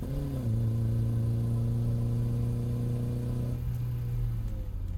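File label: engine high loop; Blind Drive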